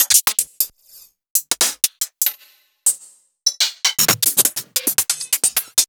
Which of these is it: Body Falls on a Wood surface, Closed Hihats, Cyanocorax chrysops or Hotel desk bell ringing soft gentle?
Closed Hihats